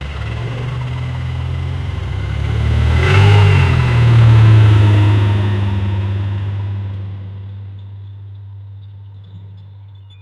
big tractor, machinery; boss swing

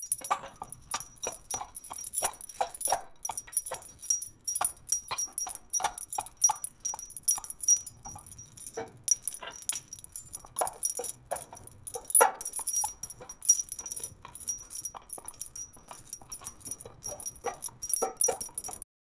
dogs run on a metallic

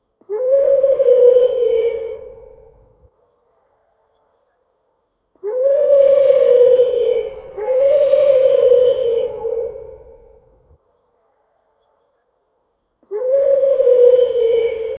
wild owl bird woman voice; birds

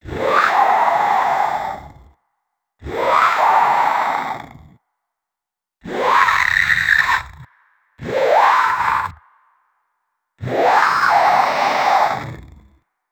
giant monster angler fish

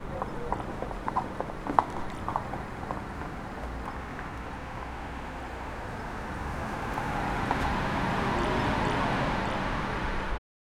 sounds of the evening cit, atmosphere

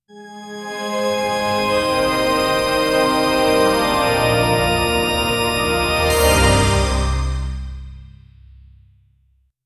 welcome screen introducti; introduction

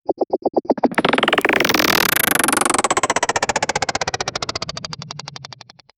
clean gear clicking sound

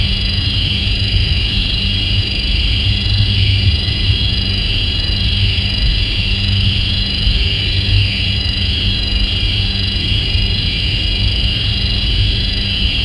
nature in background loop, birds

